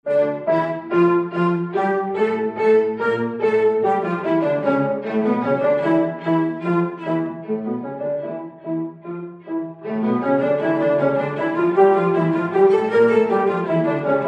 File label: Concerto 3 Pianos